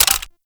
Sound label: Gunblade Mech; Tenno